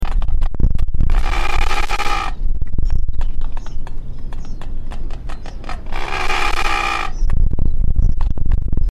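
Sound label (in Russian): krasnonogaya olusha golos, red-footed booby voice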